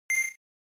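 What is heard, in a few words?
Game Boy General Pitch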